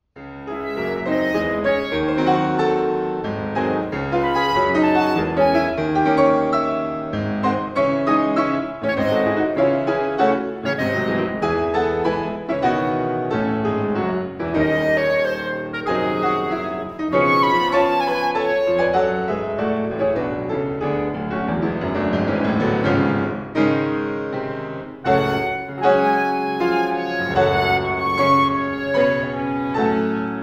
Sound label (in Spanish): Sonata para clarinete y piano; Johannes Brahms; El arte de la transcripción de Brahms a Berio